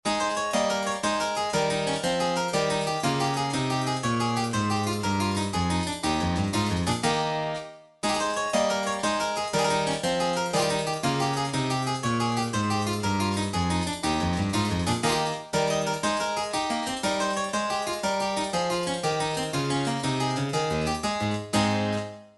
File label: gigue, midi; Song Book